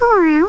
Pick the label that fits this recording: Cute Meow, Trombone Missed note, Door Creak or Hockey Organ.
Cute Meow